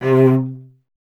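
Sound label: strings cello C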